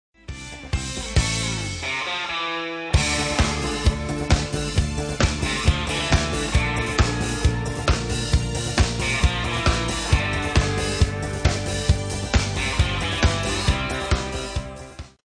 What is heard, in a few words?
Music In Style